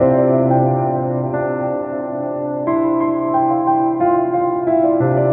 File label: Extreme Delay